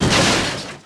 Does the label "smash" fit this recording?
Yes